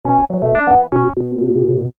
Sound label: oberheim funk sequence, basslines; esoteric